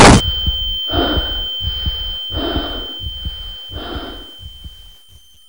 tinnitus

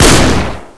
ak47 fire

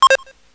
scouter

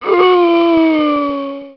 zombie attack, male

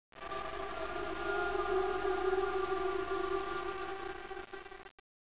Dead Sector, ambience

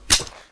nade pin out

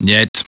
machine

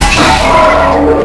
slave die; aslave

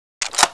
sniper reload second seq

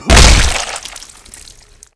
body fall slam; Physics

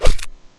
colt whip

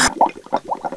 pl drown, player

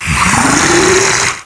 pain; baph

